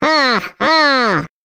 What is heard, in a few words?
Classic Miss Sound; Jolly Battle